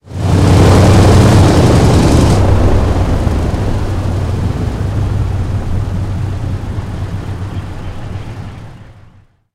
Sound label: engine c46 stop cockpit; War Thunder; engines